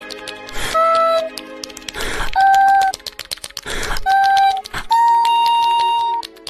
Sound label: smack smack